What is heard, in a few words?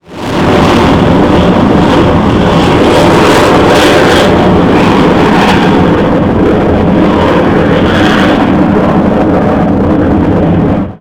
F-4 AB; phantom in der Luftwaffe